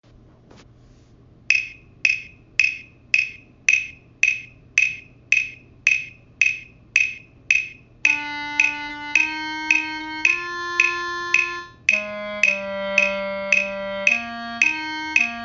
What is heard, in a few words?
clarinet